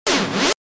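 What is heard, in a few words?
reverse transition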